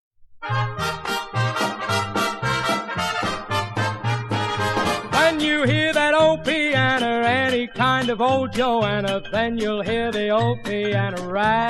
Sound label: Old Piano Rag